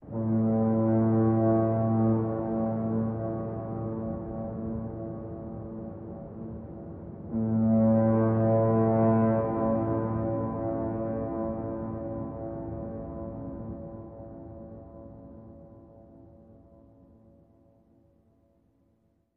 Horn Fog Ambient

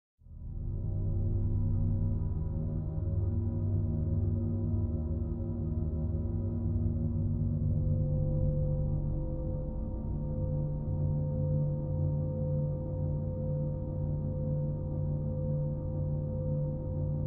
Drone Low End Sub, pads

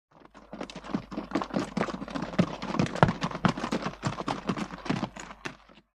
Horses Stop Three Hor

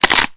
Groin Crunches Short, flesh

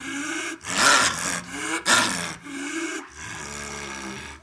Dinosaur Roar Growli

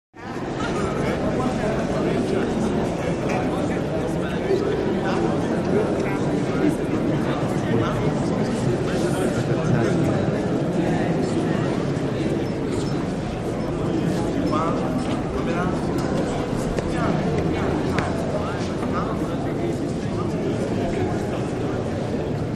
Boxing Crowd Chatter; walla

